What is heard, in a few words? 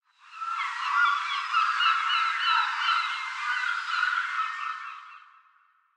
ambience ocean gulls; smite